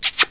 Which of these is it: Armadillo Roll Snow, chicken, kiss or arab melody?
kiss